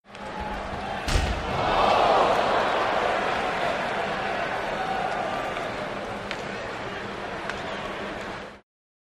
Crowd, Hockey; Player Impact On Boards And Crowd Reacts With 'ohs'.